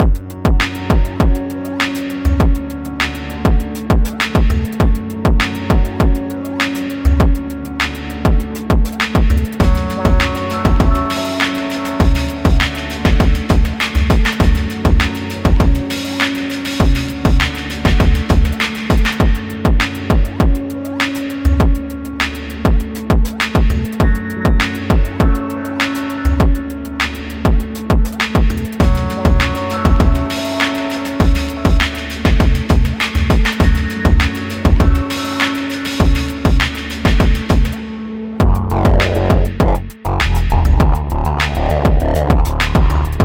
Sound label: Side Slip Stream Hop